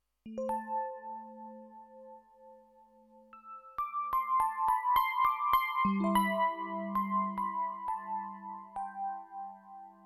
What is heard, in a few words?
Epiano